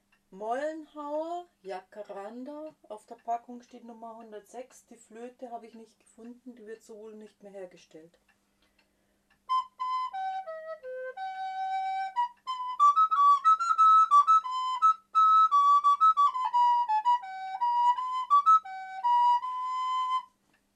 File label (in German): Mollenhauer Jacaranda, floeten; floetenschnipsel